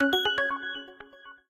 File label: Digital Decoder, notifications; Honor; MagicOS 7.1